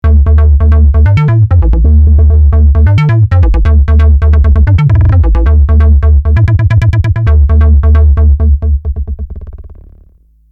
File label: monologue